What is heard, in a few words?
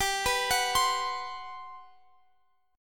G Major 11th, Guitar Open F; GM11 Chord